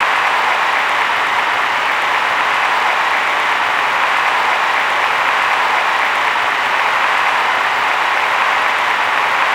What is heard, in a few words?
Large Audience